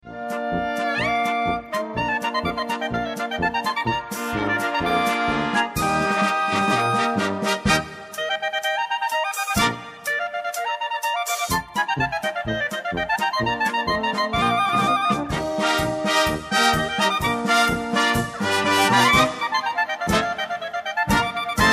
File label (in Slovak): polka; smucky